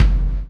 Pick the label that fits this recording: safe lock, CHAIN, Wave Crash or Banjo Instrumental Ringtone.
CHAIN